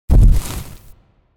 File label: Footstep From Monster Dinosaur With Debris; Hollywood Edge; sound designer's toolkit v2